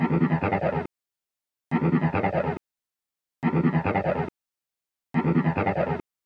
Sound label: Jelli Guit